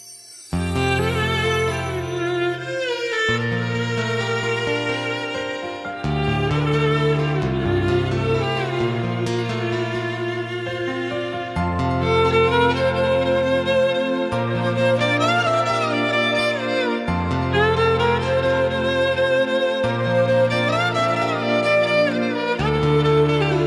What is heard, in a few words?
violin cover ringtone